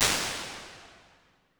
Space Reverb; Medium Spaces; Halls; Impulse Responses; Apple

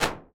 Empty Living Room, Impulse Responses; Small Spaces; Indoor Spaces; Apple

